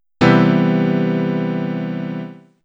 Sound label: CMaj7 chord